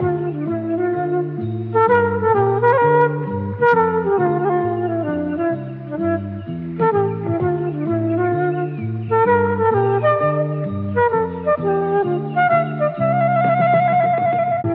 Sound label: flute, Loops Phonky